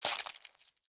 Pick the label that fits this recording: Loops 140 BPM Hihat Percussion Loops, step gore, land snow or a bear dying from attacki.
step gore